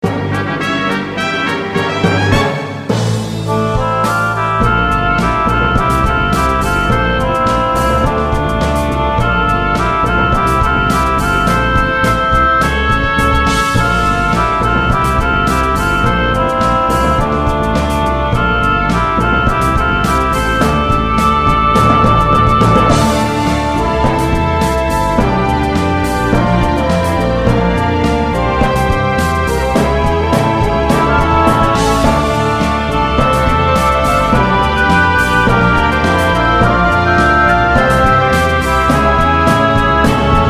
Cafe; Gensou Shoujo Wars: Dream of the Stray Dreamer

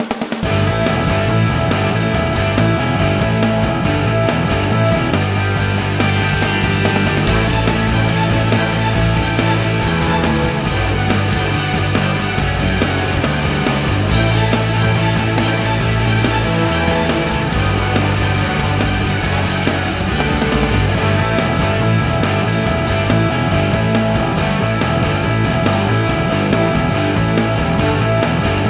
Electric Rock

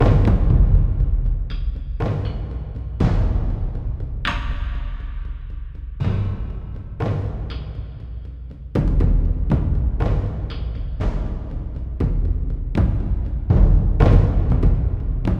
Main Menu Perc

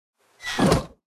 Bearsmalldoor Close, bear small door close

